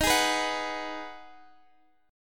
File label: G sharp Minor 7th D sharp